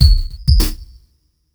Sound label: drbreak, drumbreaks; Masterbits; Vinyl Attack